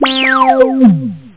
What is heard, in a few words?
303 analog; tb303 samples; frequence